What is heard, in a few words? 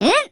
jump teehee